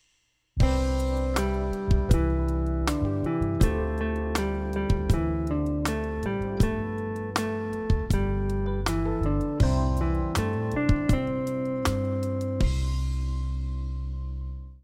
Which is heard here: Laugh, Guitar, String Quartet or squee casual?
Guitar